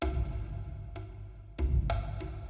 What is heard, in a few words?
Puzzle Start; City Of Light; prince of persia 2008 gamerip